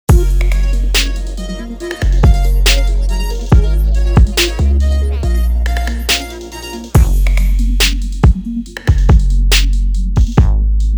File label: BEAT